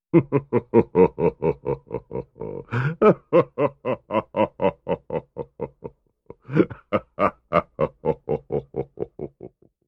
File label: Deep Vintage Laugh